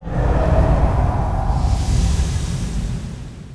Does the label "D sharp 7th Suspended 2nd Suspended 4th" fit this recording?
No